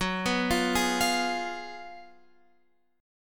Gb Major 7th Suspended 2nd Suspended 4th, Guitar Open F